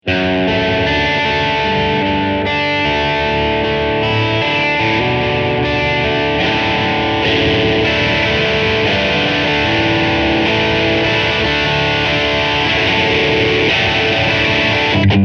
disteleki

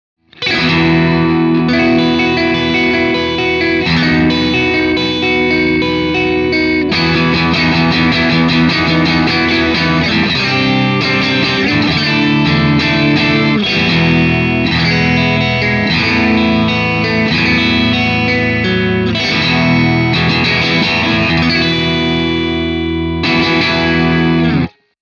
グレッチ

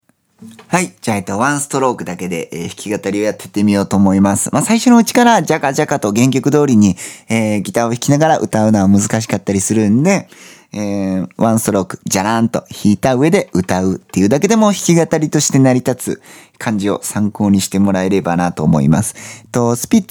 チェリー ワン ストローク

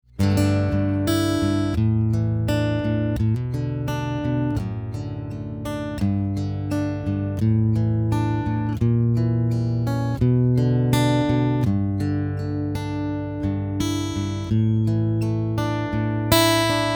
エレメント 指弾き

